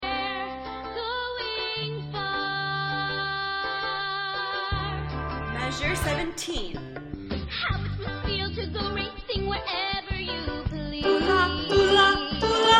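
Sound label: Soprano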